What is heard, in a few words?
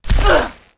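damage, monster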